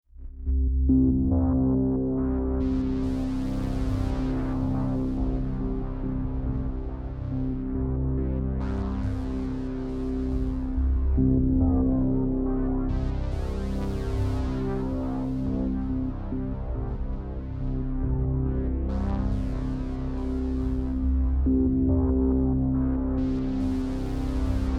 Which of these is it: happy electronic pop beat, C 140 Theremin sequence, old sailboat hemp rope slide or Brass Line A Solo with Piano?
C 140 Theremin sequence